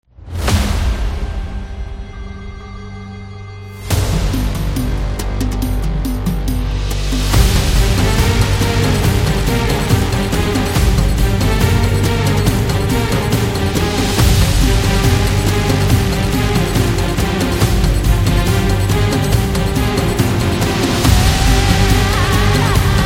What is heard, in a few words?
Middle Eastern Military